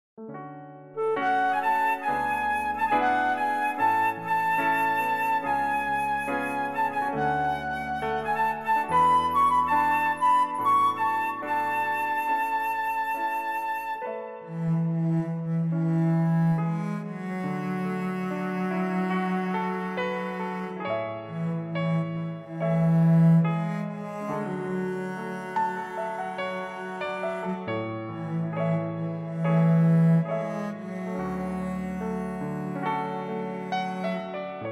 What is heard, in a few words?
cello, A